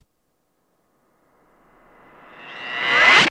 Bullet Ricochet Ban, riccos

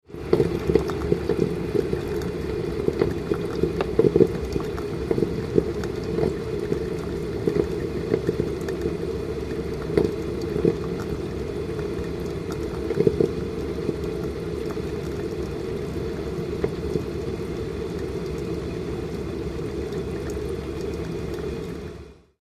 Coffee Perking 1; Bubbling Noises; Bubbling Noises With A Steady Stream Of Coffee Being Dripped Into Coffe

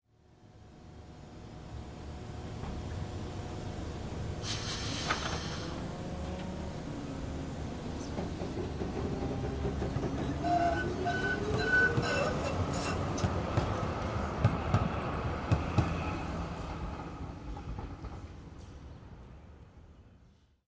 City Amb Electric Str, el trains

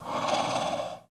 Werewolf Breath, Quiet, Small

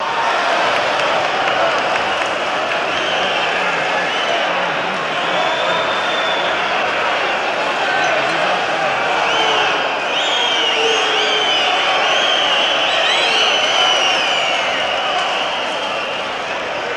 Crowd Med Walla, boxing

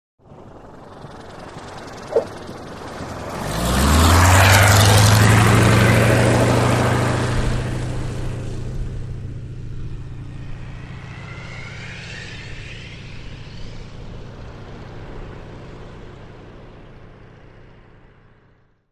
Stearman Touch Go

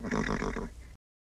Horse General Snort

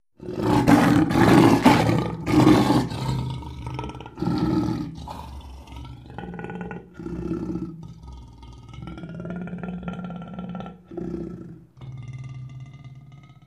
Lion Roar Heavy Snarl; big cats

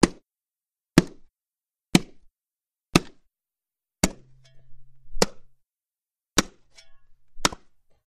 Bone Chops; gore stabs & eat

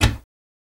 Cabinet Door Open

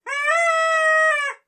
Horns 2, Various Party / Bike Horns, Long.

